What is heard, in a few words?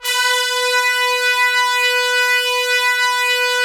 Trumpets, BRS TPS 2DR; Roland - LA Composer vol. 4